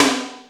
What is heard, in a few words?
TOM ROTOTO; Roland LA; DRM Toms